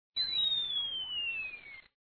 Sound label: Speed Up; snowline